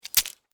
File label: Scorpion Tail Snap, Scorpion, Tail, Snap, Stinger, Attack, Arachnid, Insect, Bug; Scorpions; Sound Effects:Animals:Insects; Blastwave